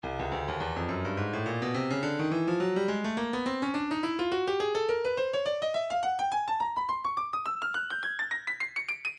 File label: breakbeat 105 bpm; headbobber d; Stylus Breakbeat Midi Files